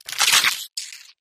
bite

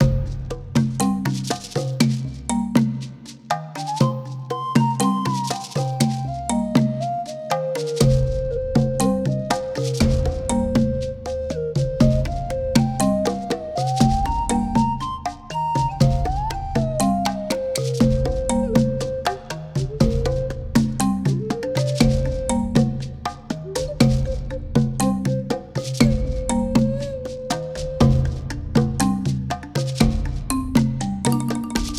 marimba

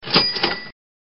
cha ching

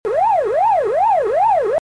ghost siren

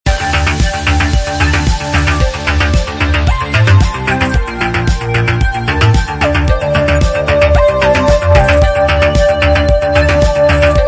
retro wave style track

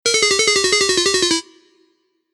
game over

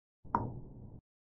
click

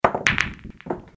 pool sound

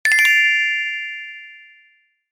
Success sound effect

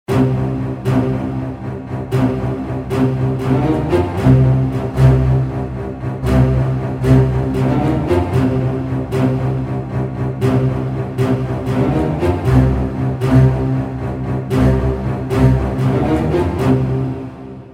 Suspense Strings

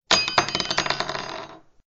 painting Falling

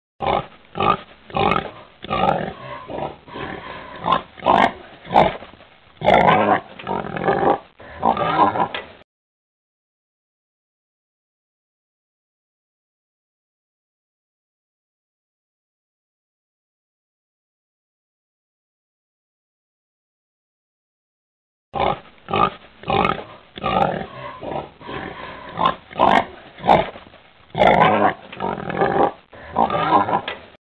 oink

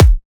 kick

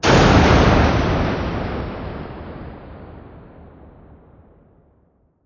explosion

